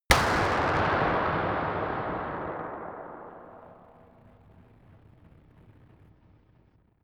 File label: gun dark pop gun